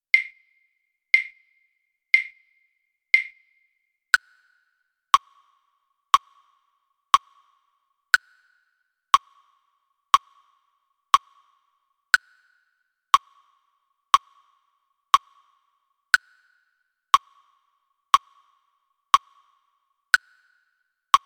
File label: pop click